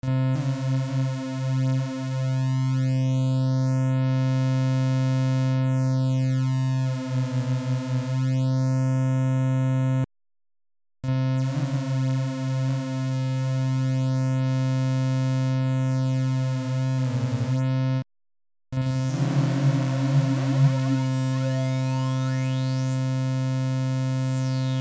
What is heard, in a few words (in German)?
kamm minus, synthesizer